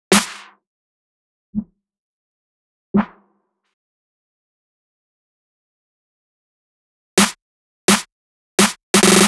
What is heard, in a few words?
Snare Loop 85